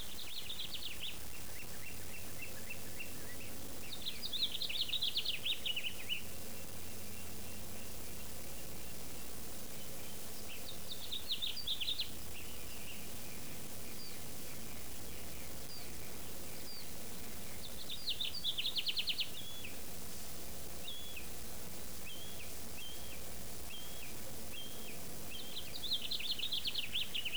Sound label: birdy; cstrike